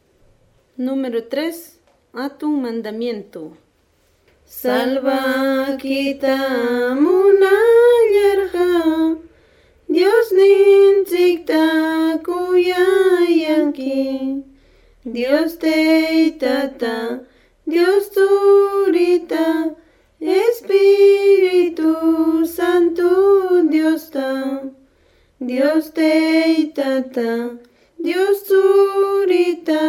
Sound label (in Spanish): cancion